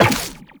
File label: Step Zipline, Footsteps; Walk; Warframe; Characters; Tenno